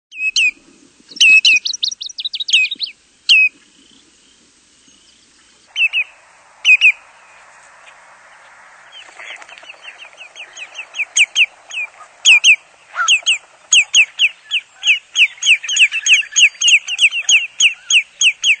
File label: Lesser Yellowlegs; Birds